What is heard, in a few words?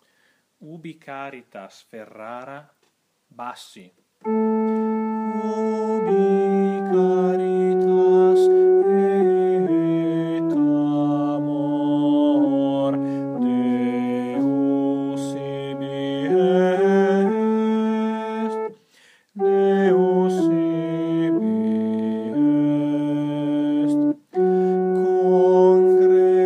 UBI Caritas BASSI; Mantovani